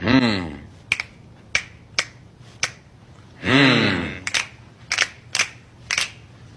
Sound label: hmm; cstrike; kzlvl